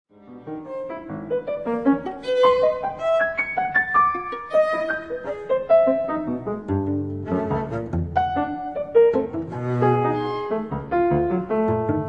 for piano violin cello Vivace misterioso; Mamlok; Panta Rhei (Time in Flux); In Waltz

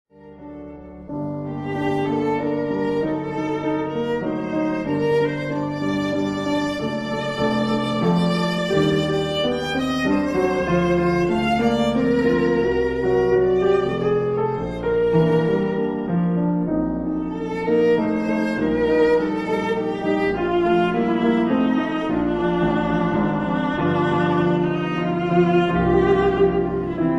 Albumblatter For Viola Piano Andante sostenuto; Sitt; Op 39

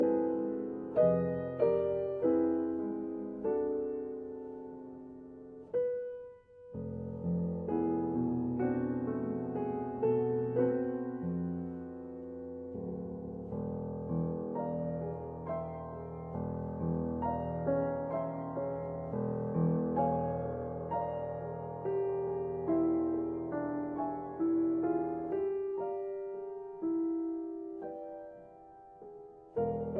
Piano Sonata, Pastorale Andante Calmo

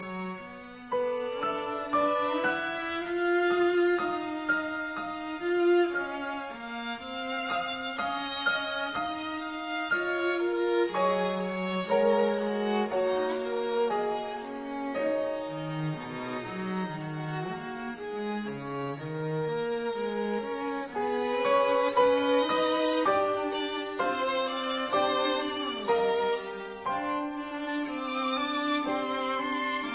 Trio; Mirecourt Trio

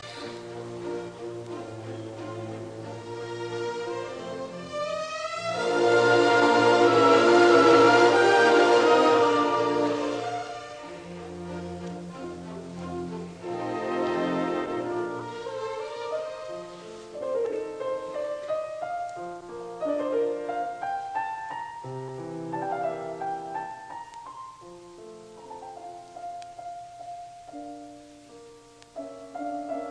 Piano Concerto in D minor Romance; Mozart

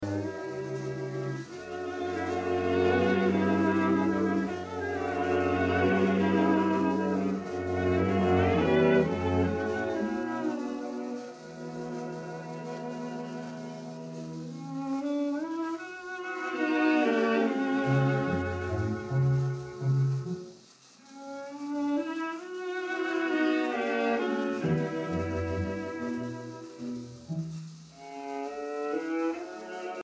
An Irish Melody; Londonderry Air

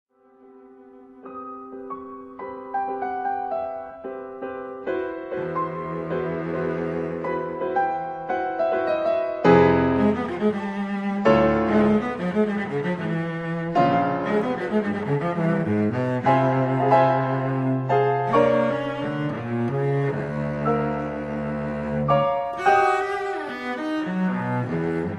Meditations for cello and piano, Fantasy

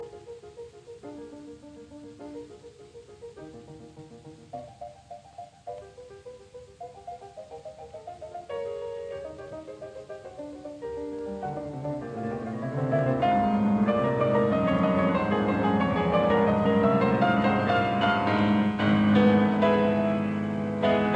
Fantasia in C minor; Op 80; Choral Fantasy; Arturo Toscanini

